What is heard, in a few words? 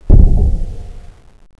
player swim